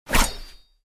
Katana swings in air

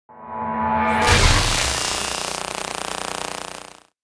Electricity whoosh transition; cinematic

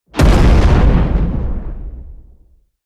Explosion shockwave impact large powerful

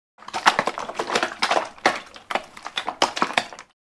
Mixing paint small can with metal stick

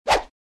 Cartoon whoosh

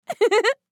Woman giggling short

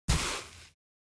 Footsteps on sand barefoot single step

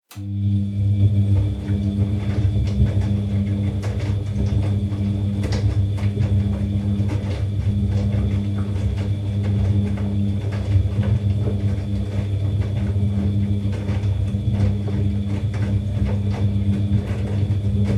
Dishwasher running constant; appliances

